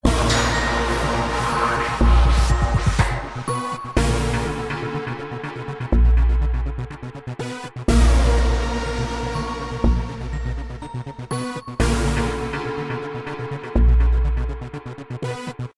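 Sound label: Music Lose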